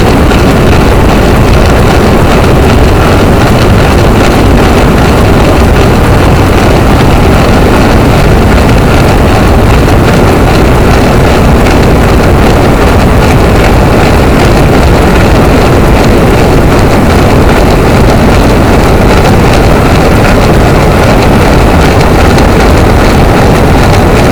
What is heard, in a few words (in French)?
cachalot, boat; Physeter Macrocephalus